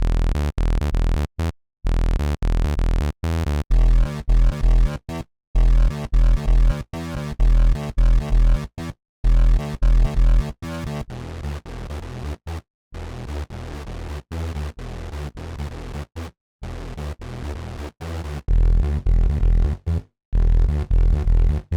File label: Raw Sawtooth; Blend